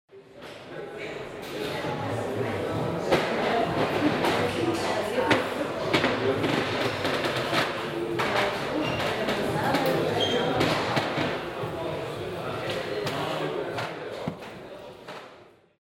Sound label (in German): Andechs Braeustueberl; Geraeusche